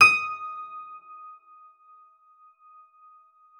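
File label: harpsichord D#6; KLAVICYMB; Xsample VOL-8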